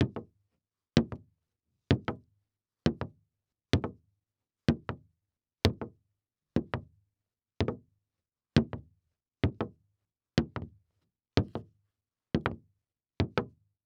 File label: Foley Footstep Cowboy Boots Walking On Solid Wood Platform; Foley Footsteps